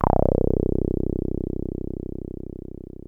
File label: 303 C1, TB 303; Hollywood Edge; Giorgio Moroder Rare Synthesizer Collection